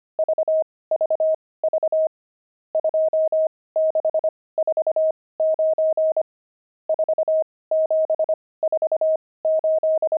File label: pangram, intermediate pangrams files